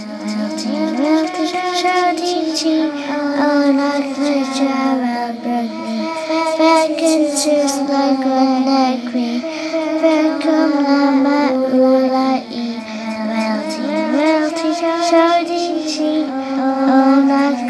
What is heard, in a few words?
girl singing 1 (ghostfx); HellSign